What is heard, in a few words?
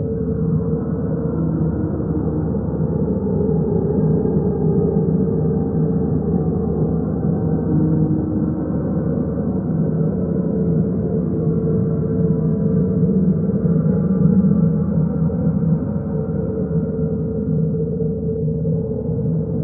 blackhole hum idle; ambient; Stellaris